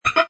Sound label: Button Digital Chime Stab; Scifi Production Elements, Set 2